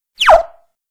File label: Close Menu; Pokemon Solar Light & Lunar Dark 1.0.1